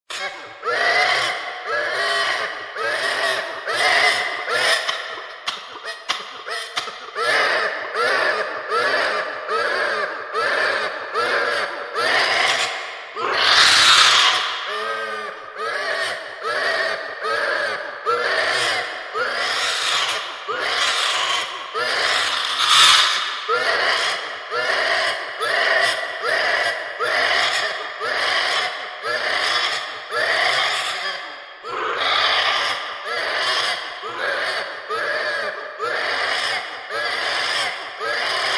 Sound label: scary possessed baby doll crying horror sound effect